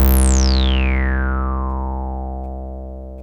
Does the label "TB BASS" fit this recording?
Yes